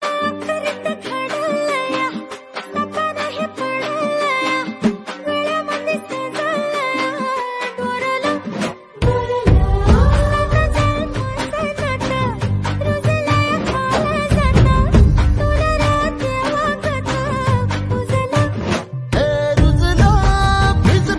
Marathi Song Ringtone

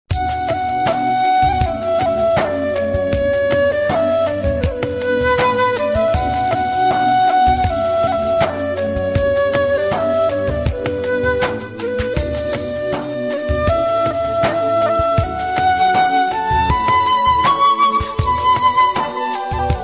Flute Song Ringtone

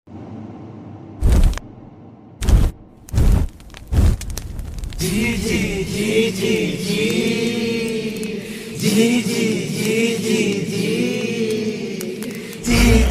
Malayalam Movie Bgm; ringtones; Jallikattu

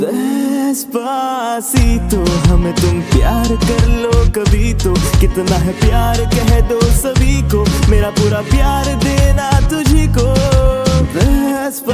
Hindi Version Ringtone; ringtones

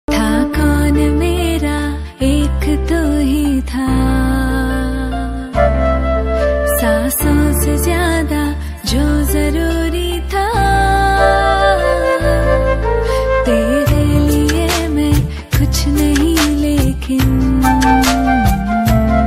Female Ringtone

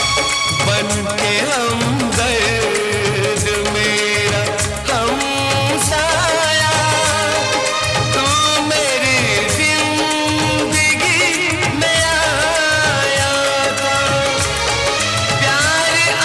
Sad Friendship Ringtone

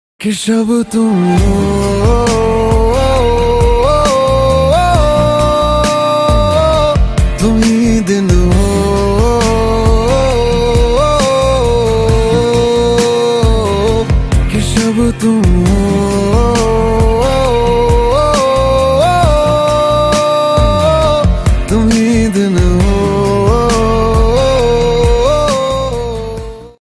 Most Romantic Ringtone

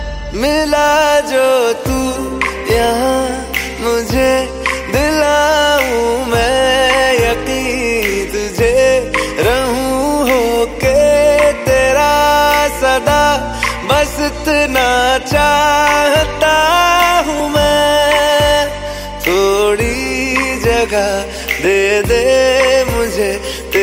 New Hindi Song Ringtone

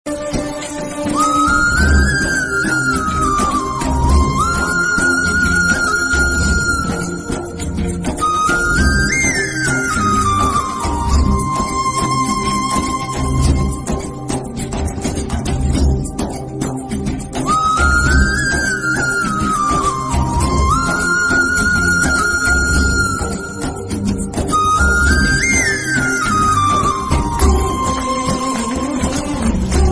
Bansuri Sangeet Rain Theme Flute Ringtone; ringtones

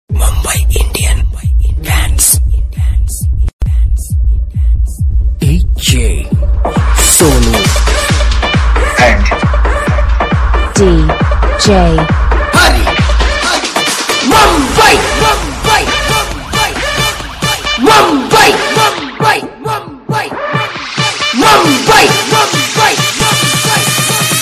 Dj Remix Ringtone; Mumbai Indians

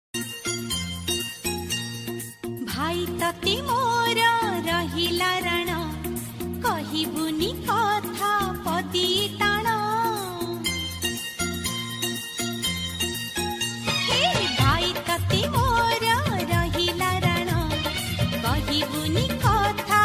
Odia Ringtone

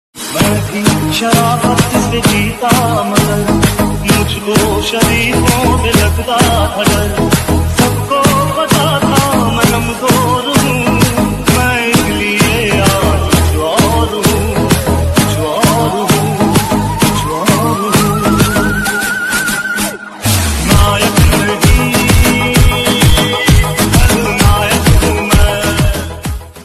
Remix Ringtone

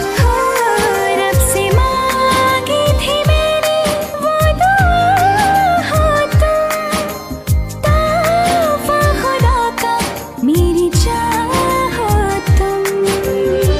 Female Ringtone

